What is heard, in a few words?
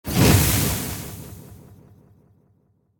Pig fireball; Super Mombo Quest